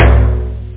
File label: rim tom; toms